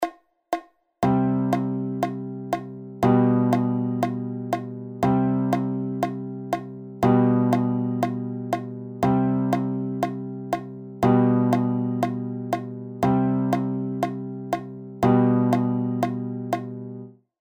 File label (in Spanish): CLAVE DE FA